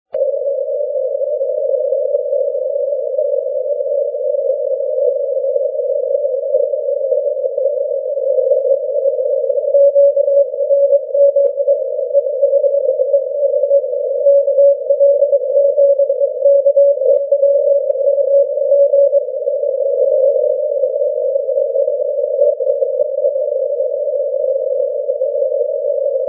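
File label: 80m continuous wave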